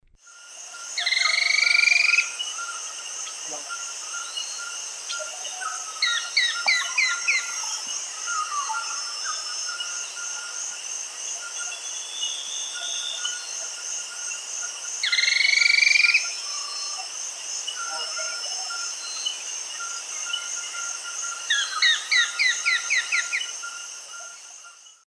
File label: Hemitriccus iohannis, call and song; S. America; Eastern Lowlands